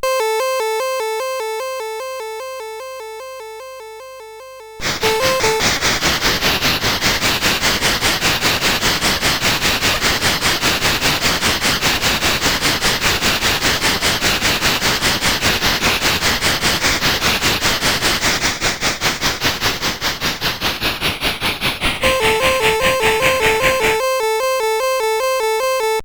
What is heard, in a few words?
Steam Engine, CSG8580R5